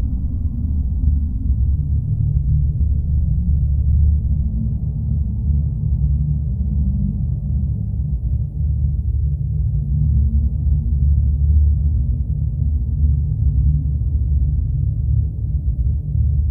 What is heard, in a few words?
Node Amb Loop